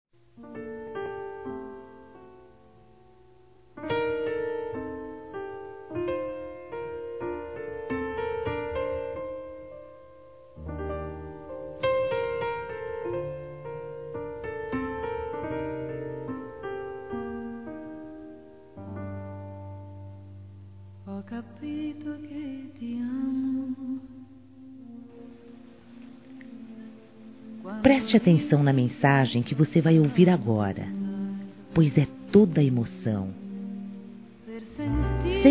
Segunda Traição Femin; Conquistas Cantadas paqueras; Telemensagens; Telemensagens 2017

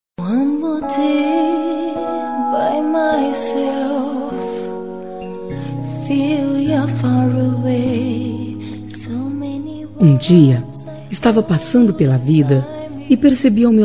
PAQUERA FEM; Telemensagens; Conquistas Cantadas paqueras; Telemensagens 2017

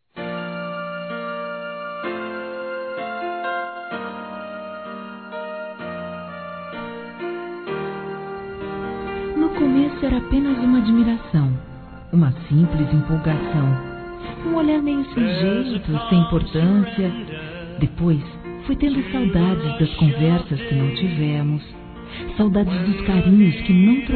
Paquera Convite para Sair Feminino; Conquistas Cantadas paqueras; Telemensagens; Telemensagens 2017

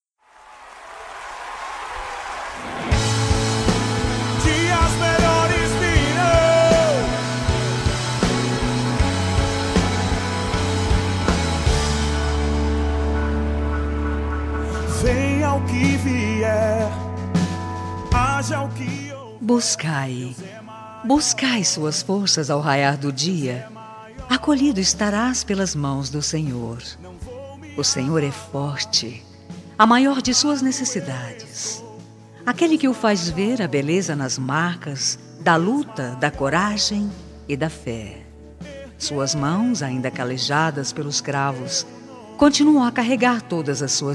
AMIZADE EVANGÉLICA, Fem

